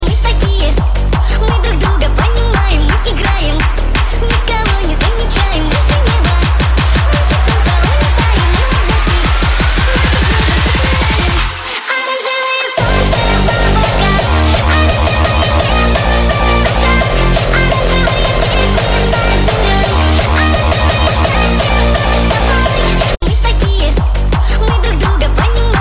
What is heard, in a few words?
hardstyle remix